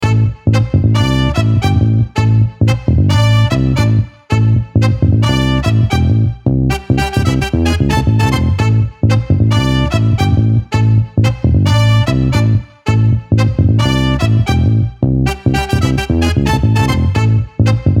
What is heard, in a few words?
Brass Acid Disco